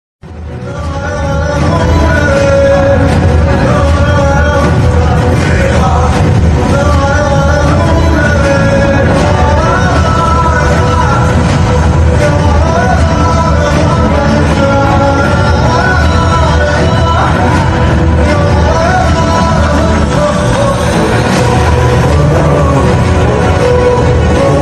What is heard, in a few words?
emotional bgm; devara; devara climax emotional bgm